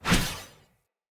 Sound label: Short Sword Whoosh Heavy B; Weapons; Enemy; Swords; SinecureShortSword